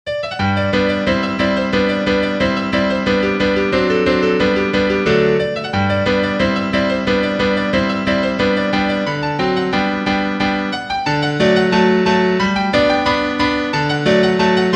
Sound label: Sailors hornpipe PIANO